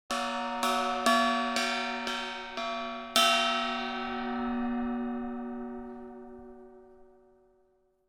Gong hit and ringing out multiple times

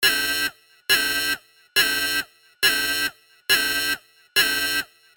Sci fi alarm siren loopable

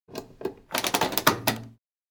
Washing machine washer choosing program

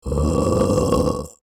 Disgusting meme burp

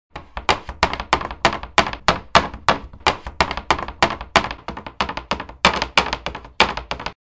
Wooden shelf multiple small impacts

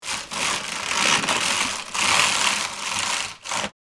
Roller blind cord pull roll up; blinds

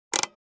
Rifle optic adjustment; assault rifle

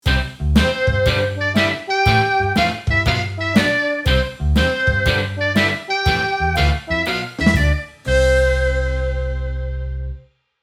Celebrative moment upbeat band sitcom

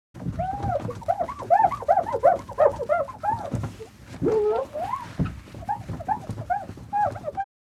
Mirror cleaning glass cleaning wipe

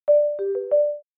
Notification alert

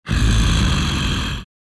Werewolf angry grunt

